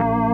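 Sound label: odd organ A#3; Best Service; Advanced Media Trax Modern Composer; DANCE ORG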